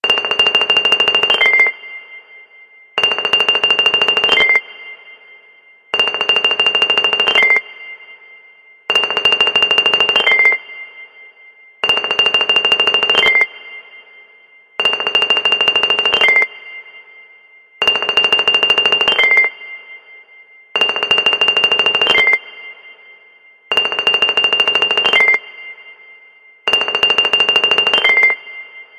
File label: Scampering; Galaxy S4